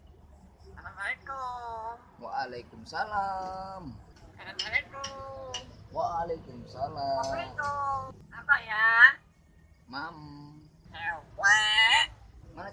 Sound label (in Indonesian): Suara Burung Beo Gacor Alami dan Bicara